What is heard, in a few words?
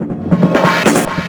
TECHNO FX 140